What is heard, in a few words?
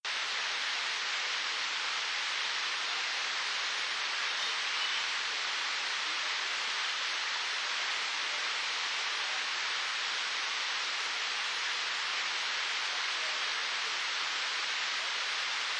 eagle cry